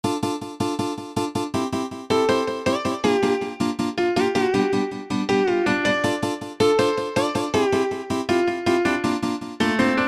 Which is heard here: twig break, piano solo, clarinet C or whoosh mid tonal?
piano solo